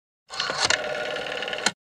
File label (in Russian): phone